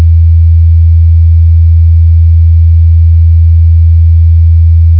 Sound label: tuner bass e